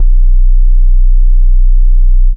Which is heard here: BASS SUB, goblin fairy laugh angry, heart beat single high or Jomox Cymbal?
BASS SUB